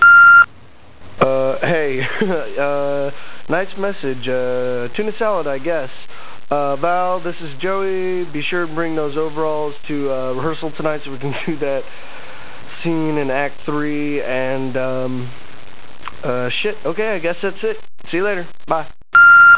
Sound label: a machine